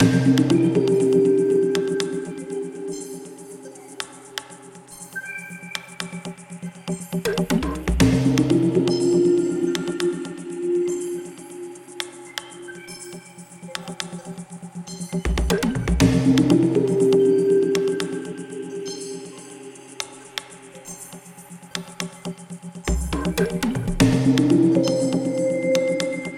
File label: Hidden Forest Friendly Loop; pan pan a tiny big adventure